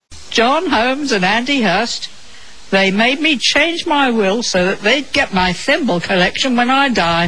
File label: jingle